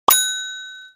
ding; pvzgw

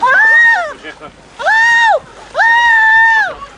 huaah

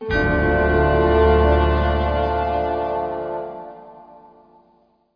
musical vine boom

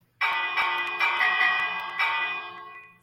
minecraft bell

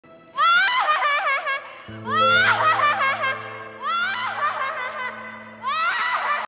laugh

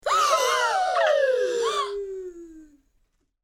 gasp

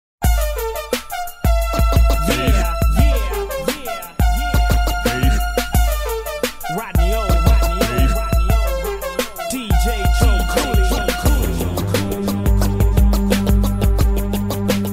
everlasting bass

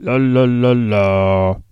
lalalaaa

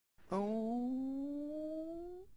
ooohhhhhh cat

